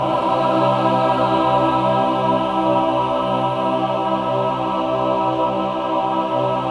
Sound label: MAJ CHORD, Symphony of Voices